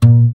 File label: GUI AC, Acoustic Slap, Roland